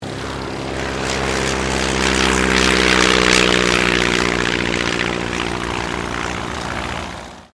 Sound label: AIRCRAFT RYAN STM-S2 TAKEOFF; 1939; Airplanes; Vehicles